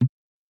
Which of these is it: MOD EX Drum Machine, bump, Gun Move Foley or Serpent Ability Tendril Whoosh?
bump